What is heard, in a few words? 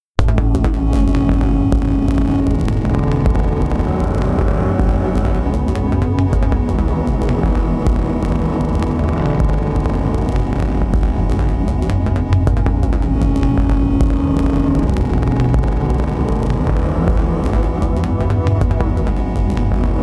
ground metal